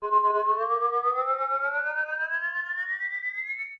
Lantern Refill

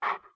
Wolf Huff; Wolf Link

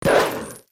Wolf Jump Attack; Wolf Link

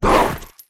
Wolf Charge Attack; Wolf Link

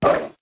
Wolf Lunge; Wolf Link

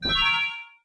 Lantern Empty

